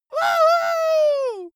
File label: dwarf cheer "wuhu"